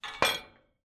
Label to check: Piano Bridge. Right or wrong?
Wrong